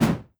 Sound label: swish